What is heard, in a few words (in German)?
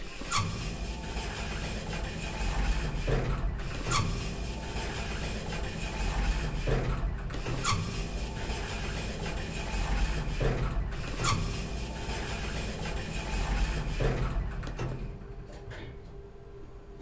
Lift